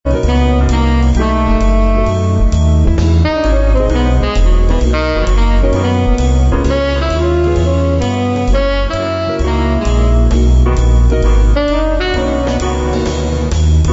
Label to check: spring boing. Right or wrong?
Wrong